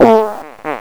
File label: fart